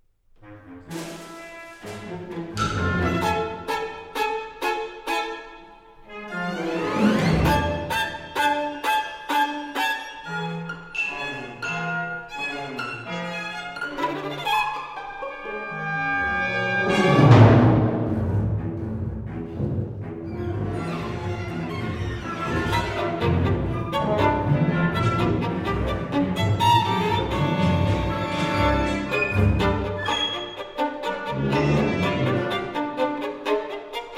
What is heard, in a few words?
Violin Concerto excerpt movement